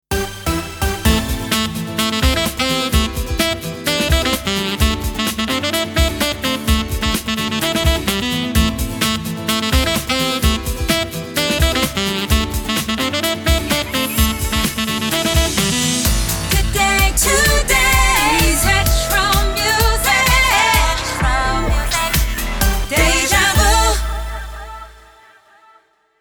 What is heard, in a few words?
Sung Jingles Radio A min 128bpm Ramp; Deja Vu Radio